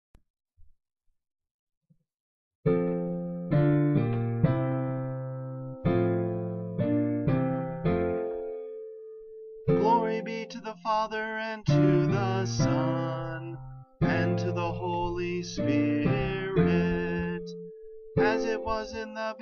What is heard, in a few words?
Chant tone B (F maj.)